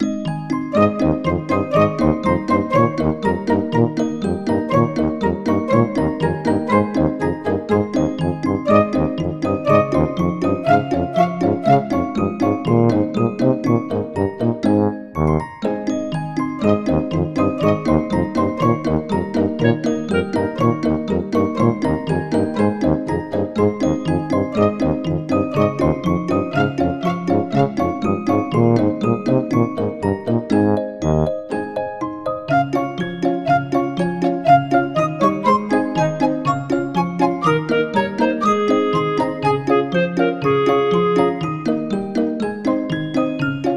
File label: Muddy Puddle; peppa pig world adventures